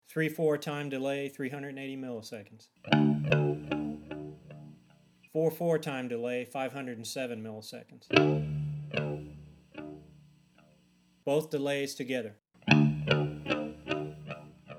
Setting Two Echo Delays

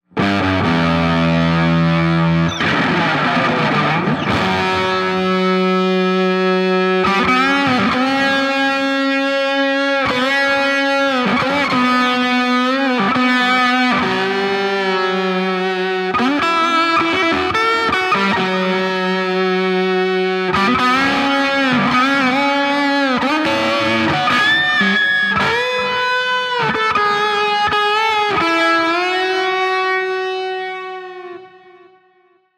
Colosound on Boost

